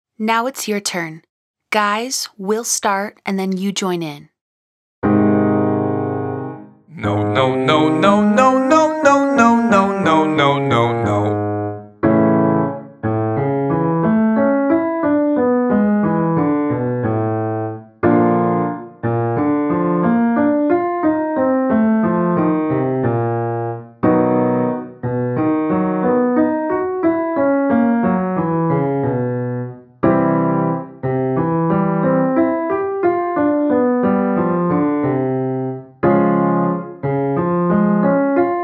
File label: No No No Long Scale Male Version